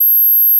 Gauss Filt G7

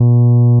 Inharmonicity, f120; Not Flat

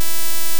DC offset Wave E4

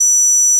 PeakSlope F#6

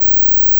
PeakSlope C#1

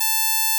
Odd Increase A5

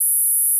Gauss Filt F5

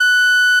Inharmonicity F#6

